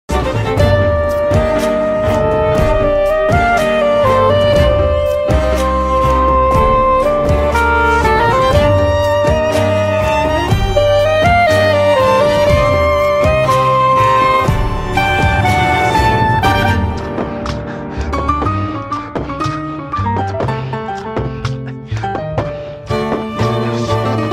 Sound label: sax and strings tango